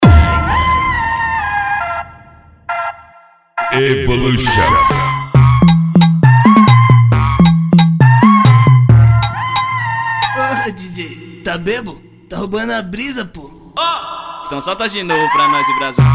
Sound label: funk tumdumdum funk bass